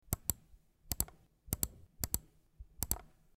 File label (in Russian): neskolko raz klik myshki